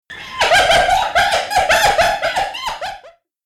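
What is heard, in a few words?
pyatnistaya giena Crocuta crocuta, spotted hyena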